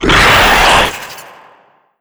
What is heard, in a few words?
spawn, antlion; creatures; vox